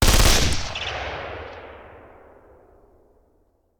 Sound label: Machine Gun; Sound Ideas; Guns